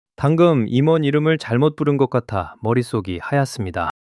fluster solo fluster

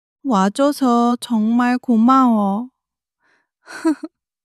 crying; monologue

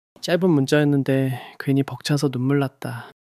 crying solo crying

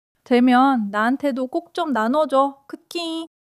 laughter

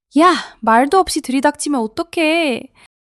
laughter

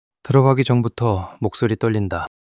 fluster solo fluster

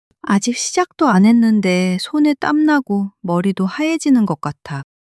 struggle long struggle

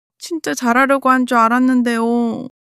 crying; monologue

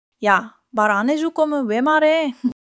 laughter

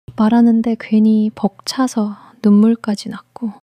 crying long crying

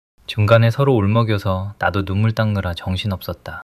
crying long crying

